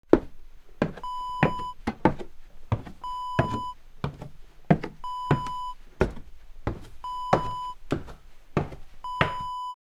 footsteps wooden stairs

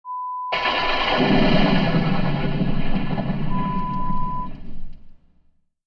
Lightning bolt

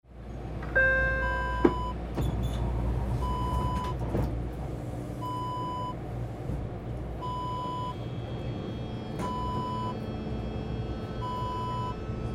subway train ambience

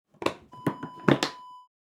case latch open

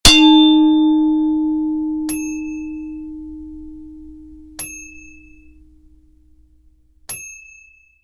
Boxing Bell

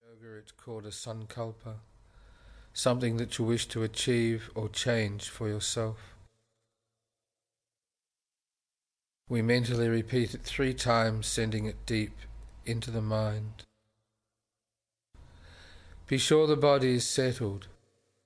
Healing Relaxation

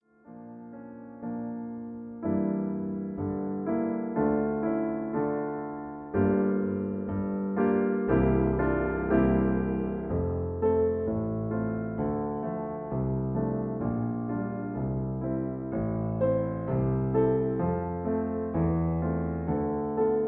Accompaniment D; 4 Serious Songs Op121; O Tod wie bitter bist du

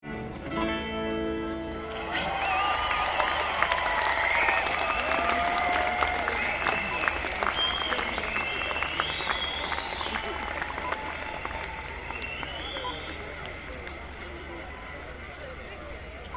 Music Festival Crowd Applause

